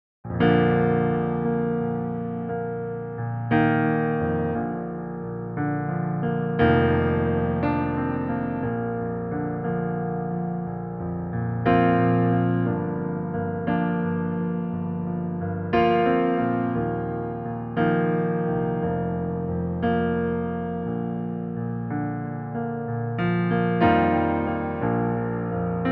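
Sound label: piano playback Bb major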